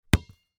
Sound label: Basketball Bounce